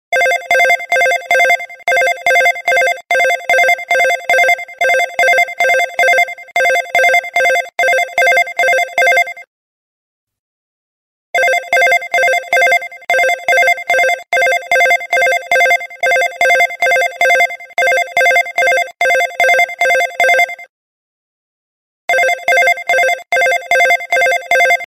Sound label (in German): Wecker New Htc